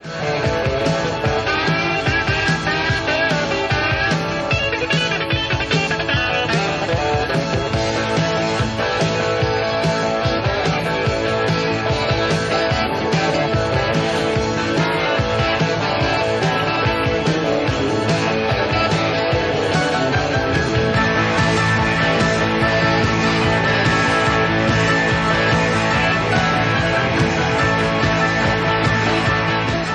Schecter Tele Black; SCHECTER TELECASTER BLACK 1980; Live - Wembley 1985; GUITARS

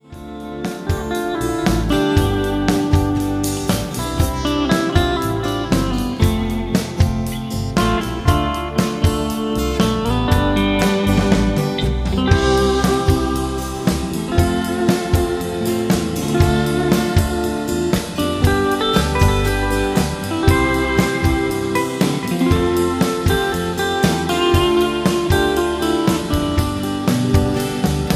Fender; AVO Session 12.11.2007

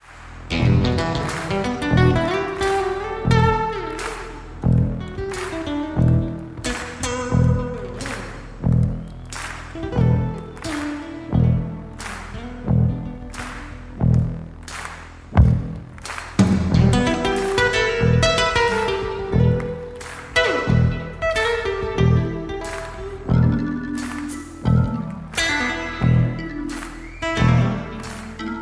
Gibson; Chet Atkins

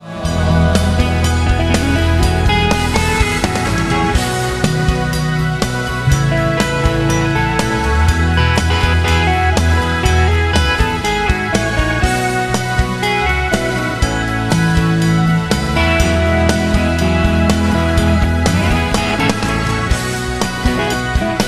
Fender 54; Florence 05

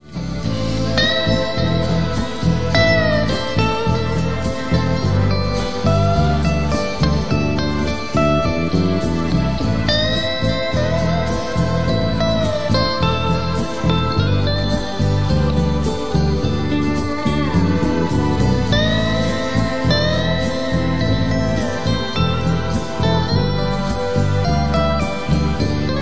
Black Proto, PENSA-SUHR; PENSA-SUHR MK BLACK "PROTOTYPE" 1987; Live - Snape 1990; GUITARS

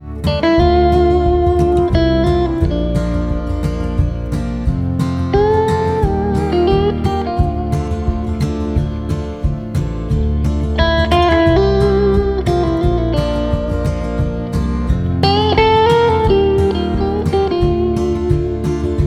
Fender

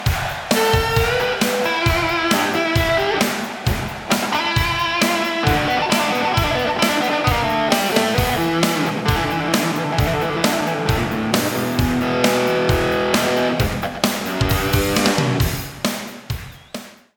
Pensa; PENSA-SUHR MKI 1988; Live - Nîmes 1992; GUITARS

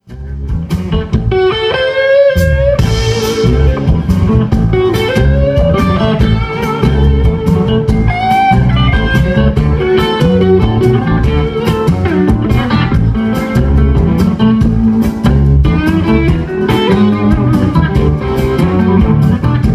Les paul 58

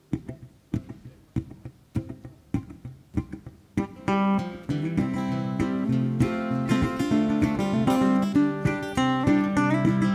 National; AVO Session

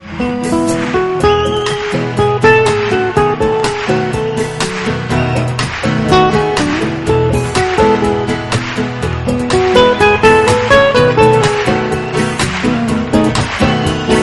Gibson Chet Atkins, Live; Australia 1986

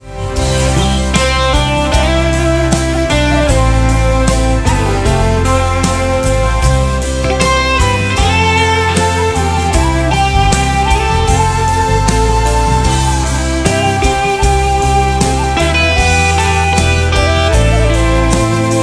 Electrajet

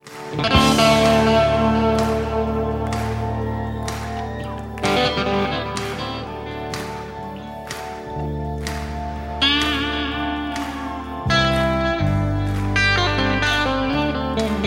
Schecter Sunburst, STRAT; SCHECTER STRAT SUNBURST 1980; GUITARS

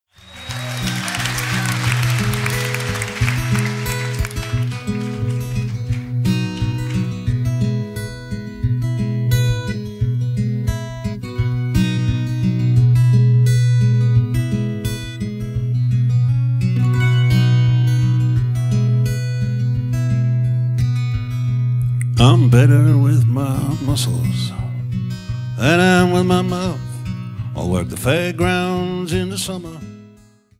Martin 000 40s; live 2009

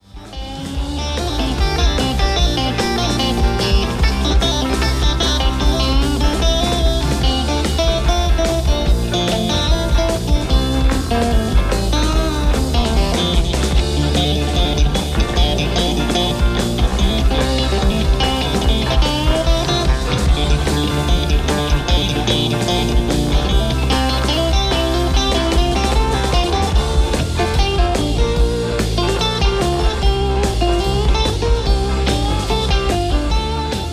Fender Signature, STRAT; FENDER STRAT MK SIGNATURE

